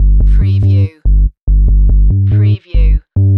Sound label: Techno-Trance Sub Bass Loop in G 142 BPM